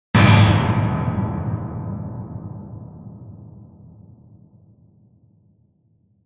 Purely Synthesised Metal Clang With Long Reverb

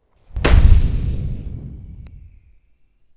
Explosion

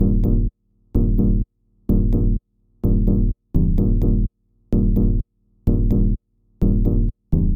127 Bpm Deep Bass Groove

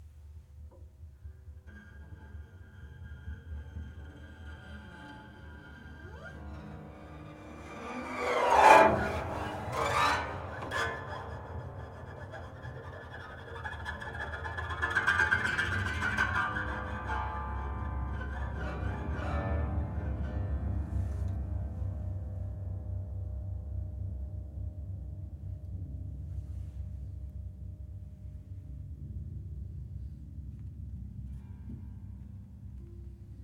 Scrape Sting

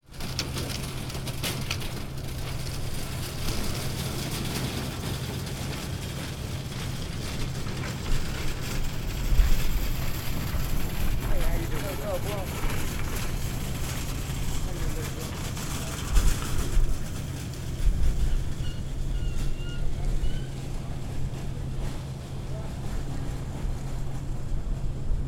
Homeless Shopping Cart

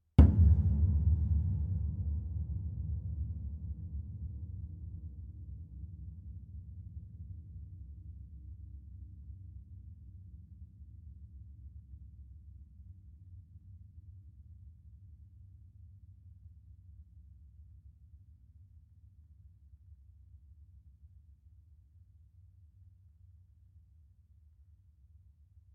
Piano Hits Hand

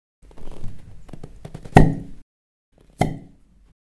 Stretch And Pop

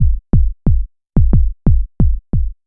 90 Bpm Attack Loop Kick Element

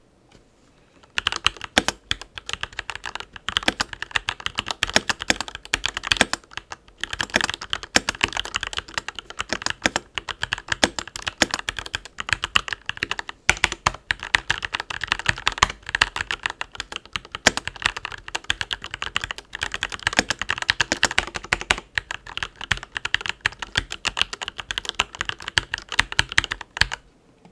Keyboard Typing Yellow Switches

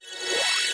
Disenchant

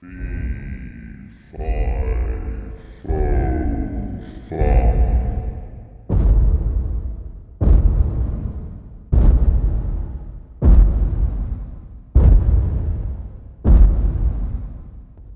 Giant Fe Fi Steps

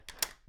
Door Unlock

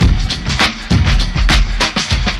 Drum loop Classic Breakbeat 100 Bpm

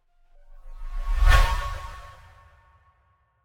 Riser

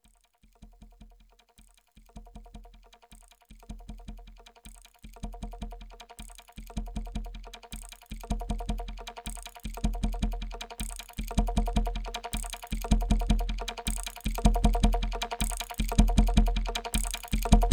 Drum Mix Two

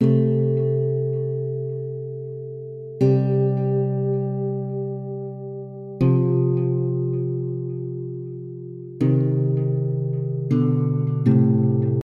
Guitar Sad

